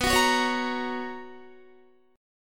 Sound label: B Major 7th Suspended 2nd Guitar, chord; BM7sus2; strummed; JGuitar